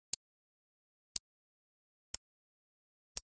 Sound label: Generic Click Metal Tiny